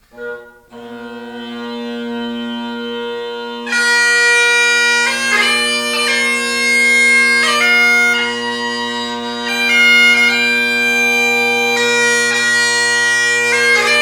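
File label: canning carbon bass, Bagpipe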